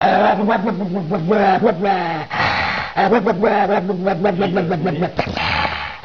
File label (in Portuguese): cachorro bue bue bue